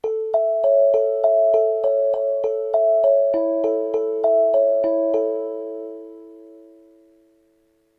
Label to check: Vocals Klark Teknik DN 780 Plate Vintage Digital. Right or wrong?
Wrong